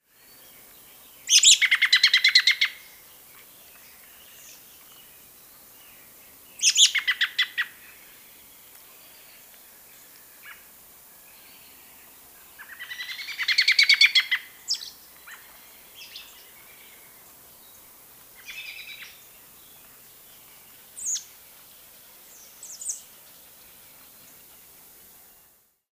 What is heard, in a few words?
American Robin Turdus migratorius; thrushes thrashers